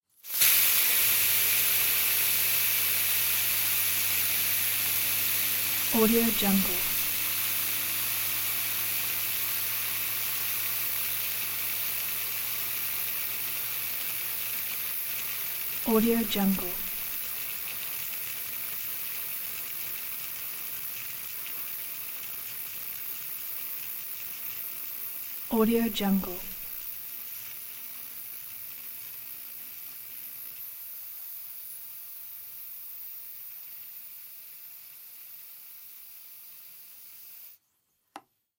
industrial steam release pressure cooker fade long full stop